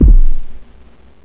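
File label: house kick bass drum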